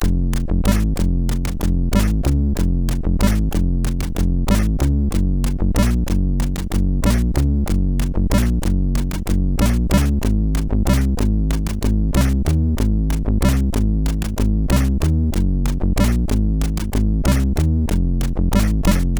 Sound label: MOS6581R4 sid; Running Scared Reprise